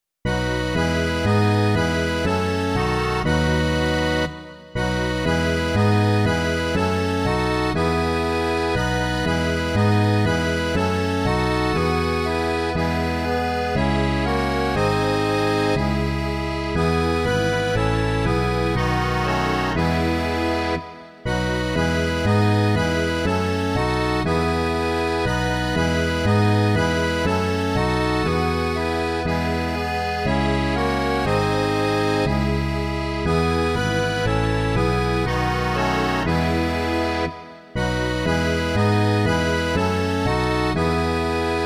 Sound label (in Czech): akordeon a baskytara; Bože Otče svatý, sešli svoje světlo; Imaginativní verze